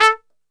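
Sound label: A2 trumpet shot, Brass Super Section; Best Service; TRP SHOT; 90 s Sample CDs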